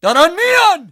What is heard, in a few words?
charge, Voices Emotes; civ13; civ14; iran